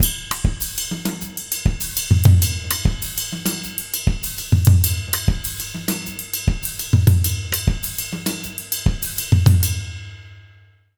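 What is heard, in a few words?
100 Mozambique, jazz latin drumloops; USB Soundscan vol.08; AKAI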